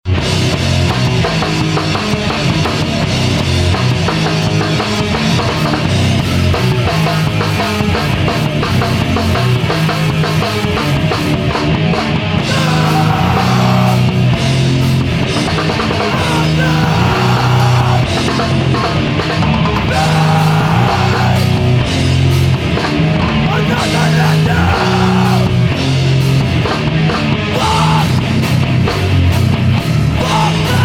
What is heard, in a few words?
Nervous Laughter